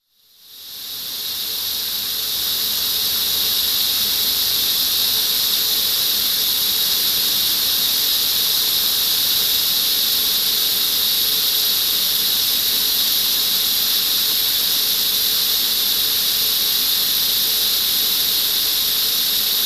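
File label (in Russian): струя пара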